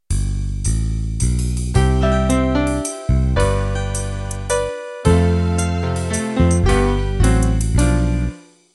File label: Country Waltz intro